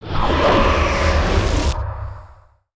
Whirlwind Scythe

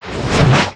Swim, foley

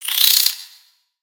Spider Exert; Lego; creatures mechanical

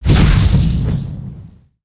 Fire On Hit; Spinjitsu

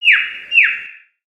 Peaceful Bird One shot

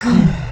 sleep, creatures organic

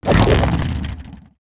Spin; Valiant Daredevil

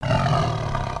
Sber Tooth Come Here, creatures pets

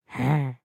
Idle Bored, creatures organic; Lego; Strombie

